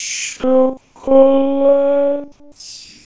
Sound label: 250Hz 300Hz lento; chocolate